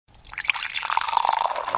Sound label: Water In A Glass